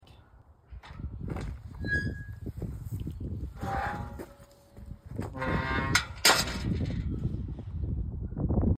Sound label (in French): sortie, portillon; POUFFONDS 79; BRUITS DE PORTAILS